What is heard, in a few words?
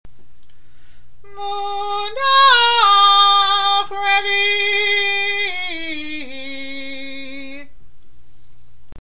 purr